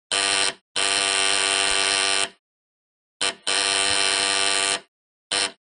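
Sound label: Door Buzzer Household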